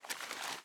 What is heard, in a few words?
ambience room shadow room working layer